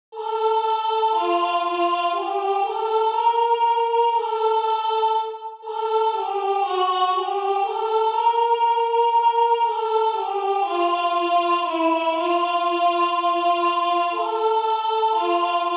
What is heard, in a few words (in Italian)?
Sopran; Orlando di Lasso; O Occhi manza Mia